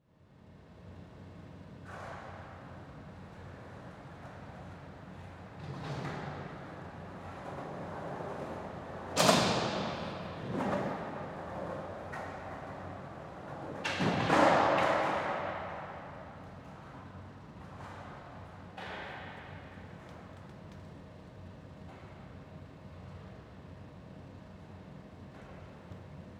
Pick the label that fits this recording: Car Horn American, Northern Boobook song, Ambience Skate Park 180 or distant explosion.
Ambience Skate Park 180